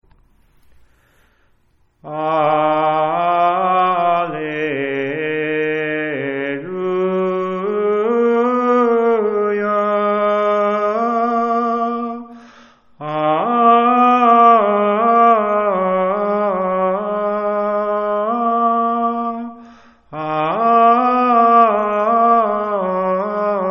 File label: Alleluia Psalmtone, sacred chant; Ascension